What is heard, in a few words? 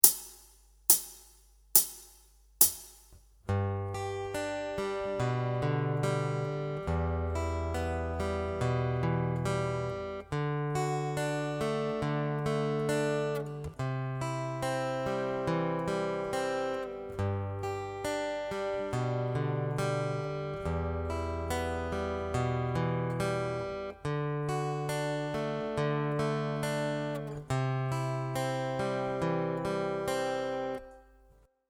Chord Pick Pattern